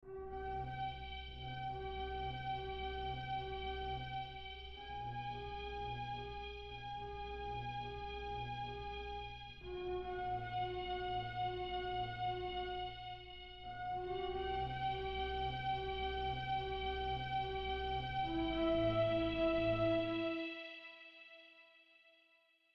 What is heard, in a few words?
Warm Strings Pad